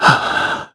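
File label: Vox Sigh, Korean; heroes; Shakmeh; kings raid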